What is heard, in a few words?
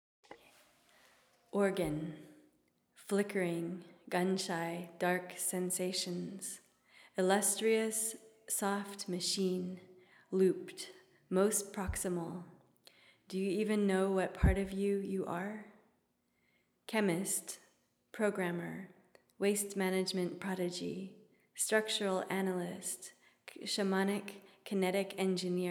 Organ